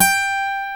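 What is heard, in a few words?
G 4 MARTIN, acoustic guitar; AKAI S6000 CD-ROM - Volume 1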